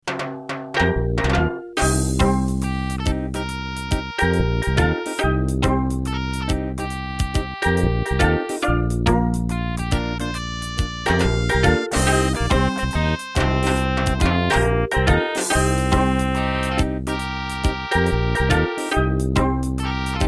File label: Terratec MiniWaveSystem TWTT; Eco Quest